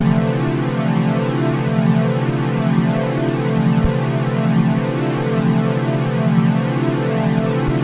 mks pad; Pads

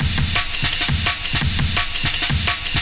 170 again; 161 to 180 bpm

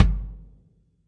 real kick; A Real Freakin Drum Kit

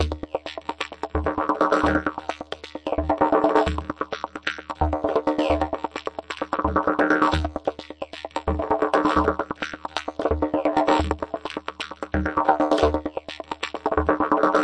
131 roller didge; cantons rollertechno; REMIXABLE COLLECTIONS

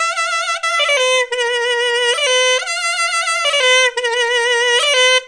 reedy instrument melody; WORLD

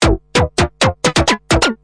130 tweaky synth melody; SYNTH AND ELECTRONIC LOOPS

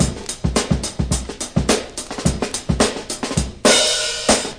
105 bpm, ghost snares to crash

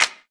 clap; Claps

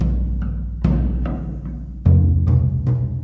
147 celtic drums, drum loops and breaks; 141 to 160 bpm

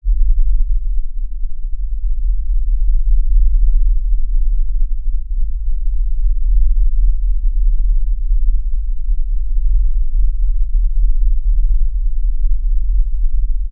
ultra low rumble; Atmospherics

